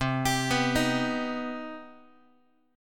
C Minor Major 7th, Guitar; arpeggiated; 4A,4E,5A,5D,5G#,6C#; x,8,10,9,7,x; JGuitar

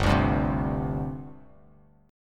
G Suspended 4th G down, Gsus4 chord; strummed; JGuitar

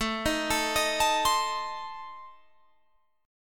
A Diminished A, Guitar Open G; chord

